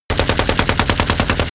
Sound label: burst close loop